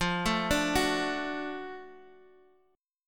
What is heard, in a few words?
F sharp Minor Major 7th Sharp 5th F